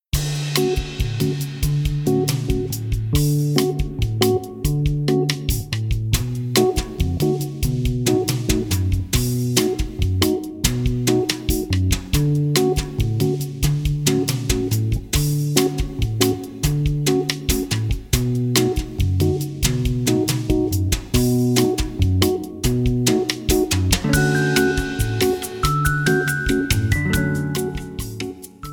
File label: Secret Bossa